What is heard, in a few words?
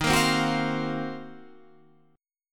D sharp Minor 6th Add 9th D sharp, chord